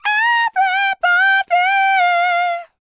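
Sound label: falsetto, R&B Dance; Spectrasonics Vocal Planet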